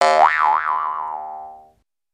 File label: Boing Sweetener